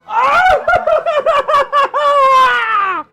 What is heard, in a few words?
ahahaha